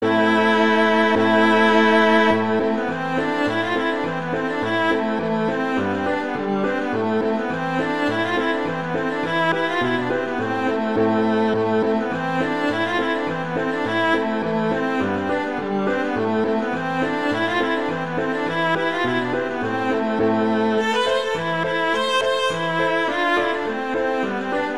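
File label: Reel, Viola Piano; Fiddle Collection; Scollays Reel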